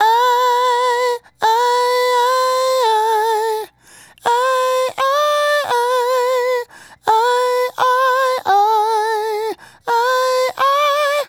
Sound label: Male Solo, Hip-Hop and RnB Vocals